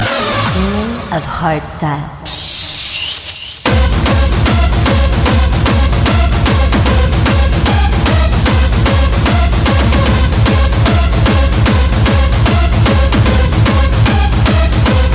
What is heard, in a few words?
Hardstyle